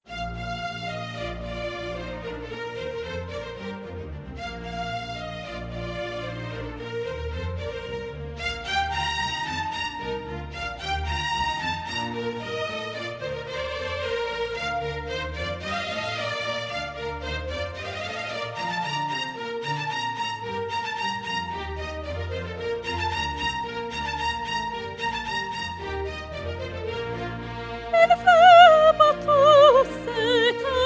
Stabat Mater Concert Version; Pergolesi

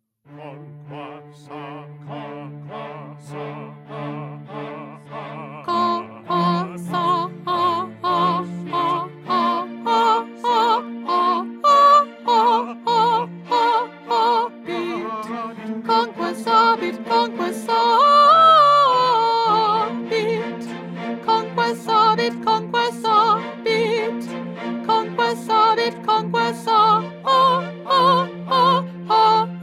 2nd Soprano

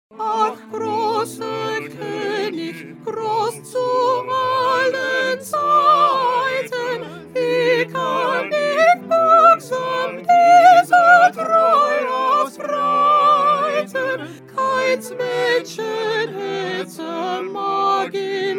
Soprano; Bach; St John Passion

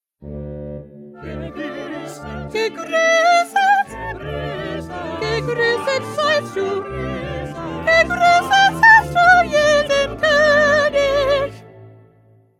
2nd Soprano

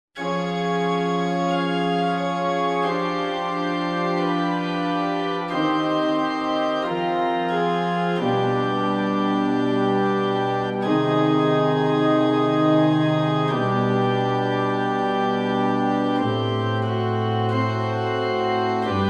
Instrumental

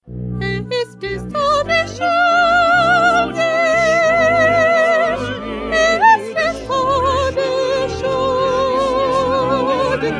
1st Soprano; Bach-St-Matthew-Passion-42

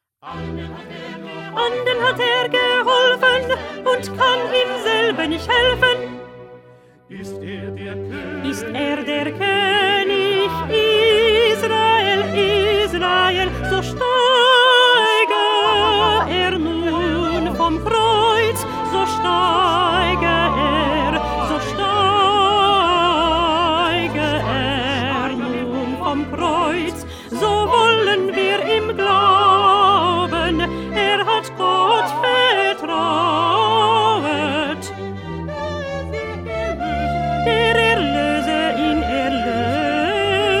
1st Alto

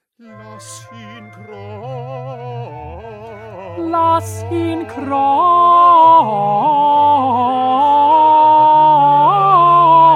Tenor; Bach; St Matthew Passion

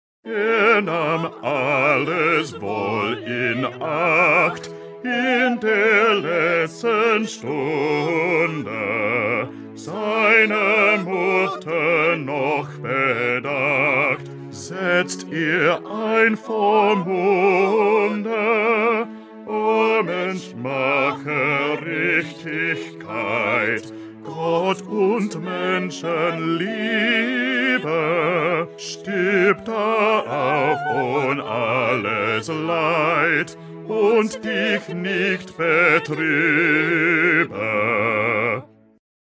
Bass

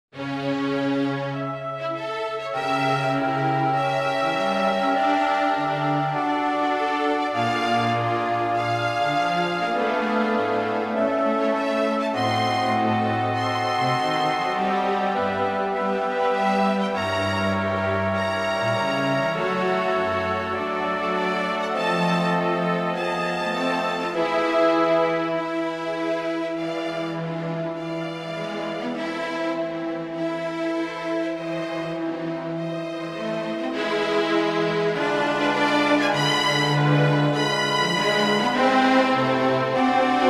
Instrumental; Mozart; Mass in C Minor; Gratias

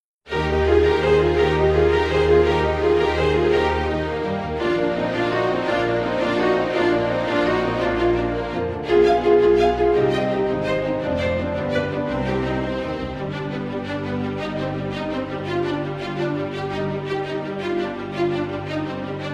Instrumental; Pergolesi; Stabat Mater

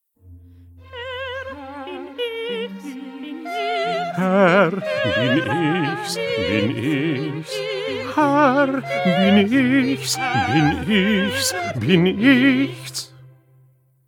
Bass; Bach; St Matthew Passion

